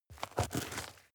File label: step dirt heavy boots scuff short; menschen; schritte